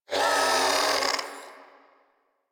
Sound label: Shadow Vocal Pain; Warframe; Duviri